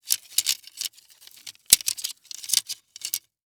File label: Sports Pole Plastic Tent Poles Moving Around